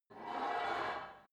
take off weight barbell reverby quick, fitnessstudio